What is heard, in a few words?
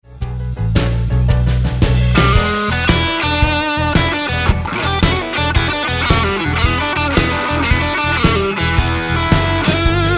Roadhouse Licks Lick, guitar; 20 Roadhouse Licks